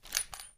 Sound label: sheath